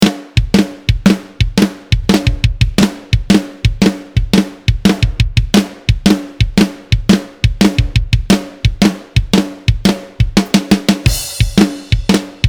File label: Flam Slam Beat 87bpm; Unity of Noise